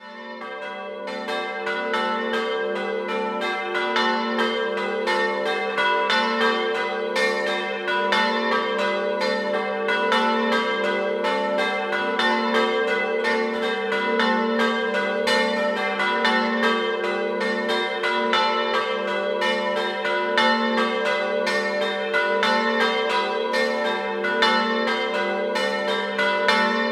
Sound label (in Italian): campane Doppio; Chiasottis